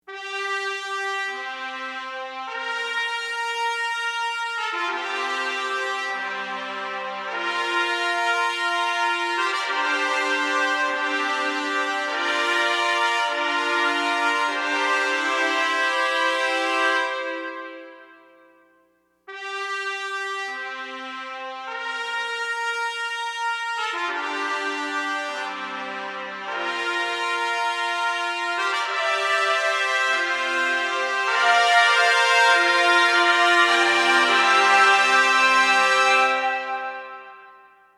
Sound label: anthem, trumpet